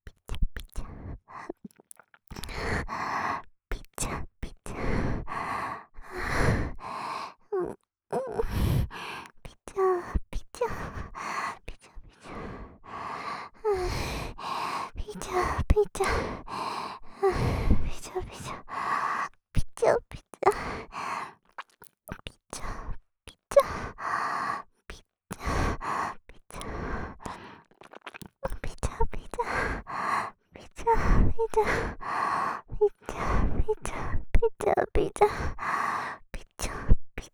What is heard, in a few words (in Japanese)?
ぴちゃぴちゃ…, ASMR, 左耳